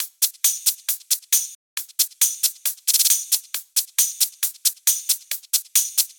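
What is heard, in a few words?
drum hihat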